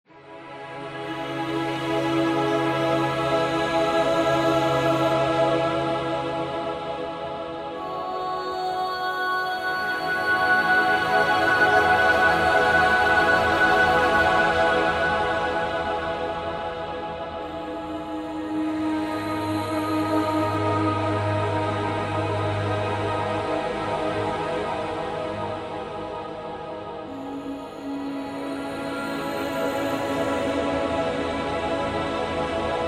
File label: DESTROYED FOREST LOOP